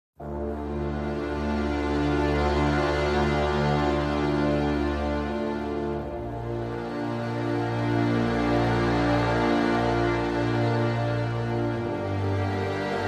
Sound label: Strings and Brass